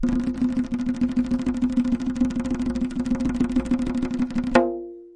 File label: arabic perc, Arabic Percussion Kit